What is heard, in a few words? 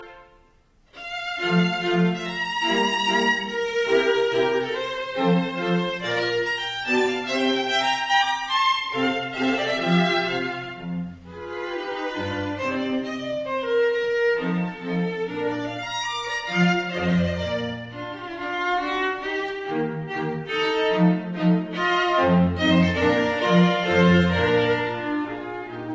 String Quartet No 10 in B flat major Menuetto; Haydn; Op 2 No 6